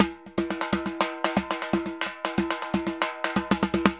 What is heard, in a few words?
TIMBA, MERENGUE; Best Service ProSamples vol.14; AKAI